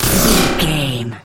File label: sci-fi weapon blaster laser deep drone